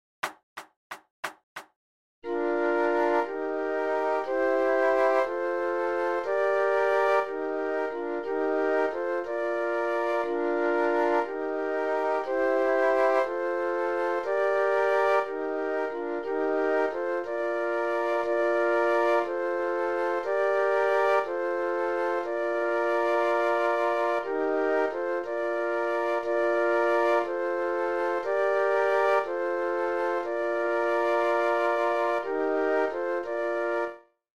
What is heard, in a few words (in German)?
Floeten